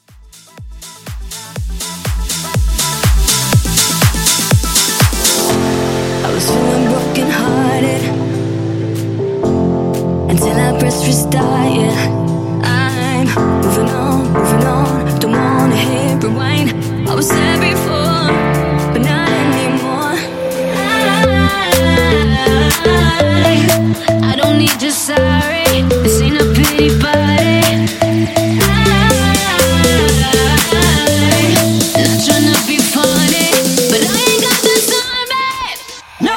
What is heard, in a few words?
Deep House Mashup